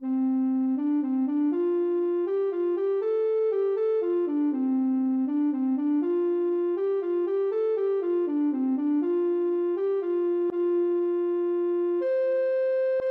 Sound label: arirang, ocarina